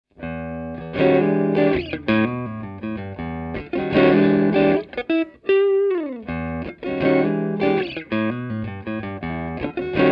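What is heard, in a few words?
Multimic Blend Electric Guitar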